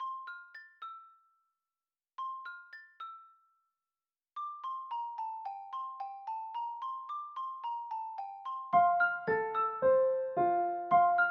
almost adulthood piano; inbento

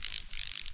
Amb Bug; Voices of the Void

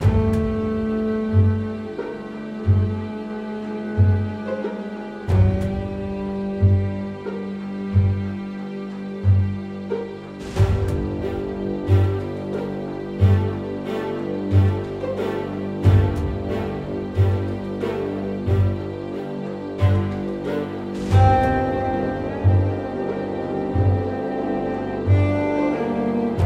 Suspense Dialogue Loop

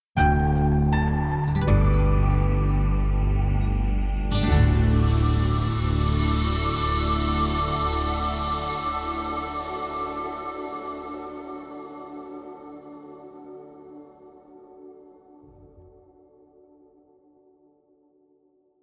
Night

